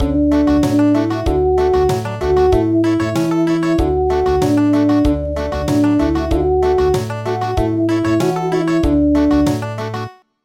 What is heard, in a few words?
Country, midi traditional